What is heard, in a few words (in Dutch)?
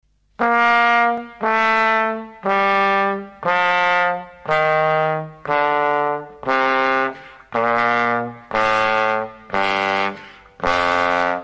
Koperblazers trombone van hoog naar laag